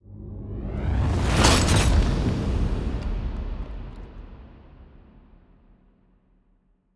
ship construction ship move; Stellaris; toxoids; ships

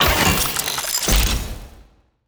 ship designer add weapon; Stellaris

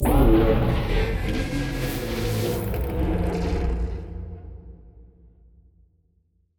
void worms nymph death; Stellaris; grand archive; ships

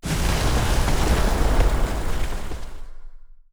alpine planet; ambient; Stellaris

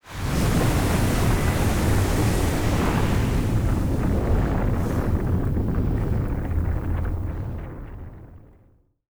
shifting sands; Stellaris; strange worlds

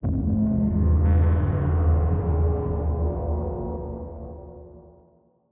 ship colossus close; ships; Stellaris; shroud

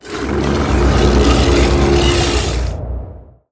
event swarm started; Stellaris

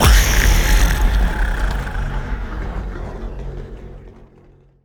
lithoid spider; lithoid portrait; Stellaris

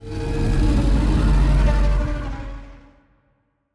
kaiju thermoclastic roar; biogenesis

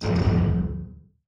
distance disruptor hit, weapons; Stellaris

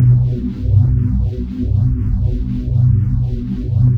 assimilate windup; apocalypse

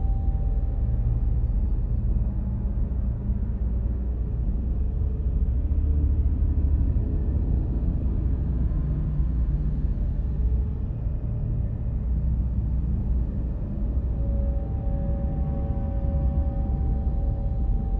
ship starbase idle; aquatics

